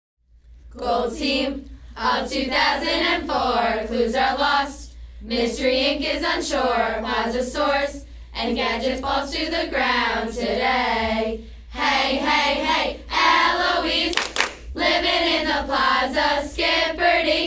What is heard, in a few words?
cheers